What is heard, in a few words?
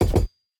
recall tap; smite; hera